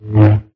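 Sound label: swing short; sinfar haks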